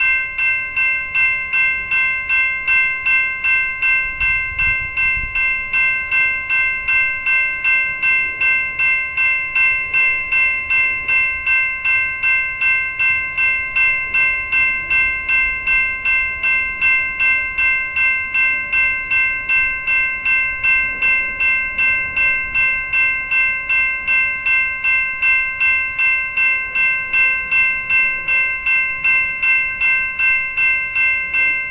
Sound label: bell